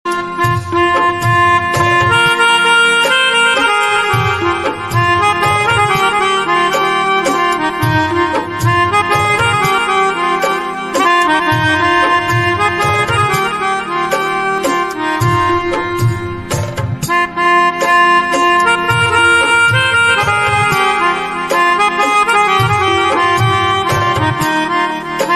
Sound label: chhath puja instrumental